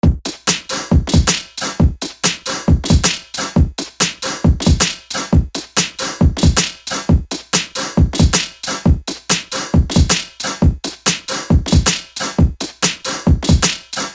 beat

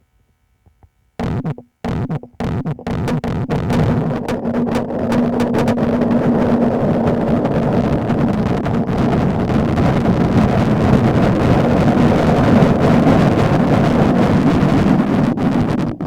Throwing rocks at a metal box on a windy day

